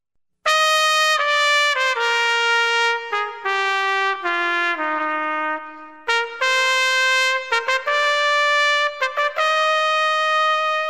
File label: Joy to the World Trumpet; Album: Contemporary Holiday Music; christmas music